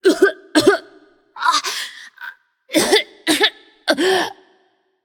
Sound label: nv clear throat; smite; voicepack; morgan le fay